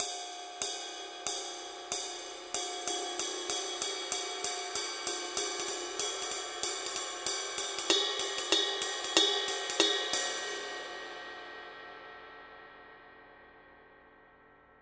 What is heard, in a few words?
dark energy ride mark i pattern; signature dark energy 21